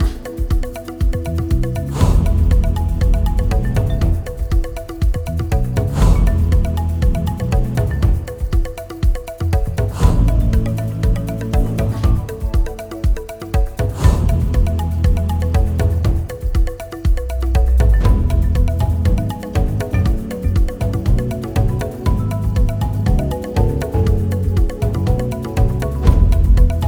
music main game night

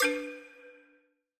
spin Button; netent

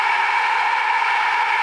win mega win loop; netent

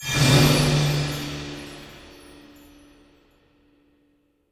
mega win text change; netent

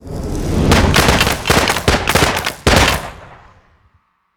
near Win; netent

